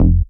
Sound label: Bass Cuts; Vengeance Samples; VES1 Instruments